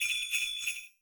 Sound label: jingle sleigh bells